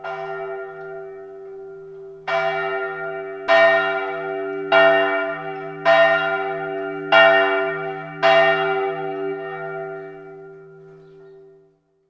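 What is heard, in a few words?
ambient constructions; Red Orchestra Ostfront 41-45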